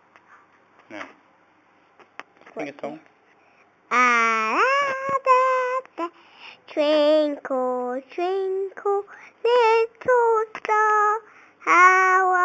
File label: sings twinkle twinkle